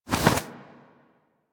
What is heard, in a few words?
Bird Of Prey Wing Flap Up; Characters; Warframe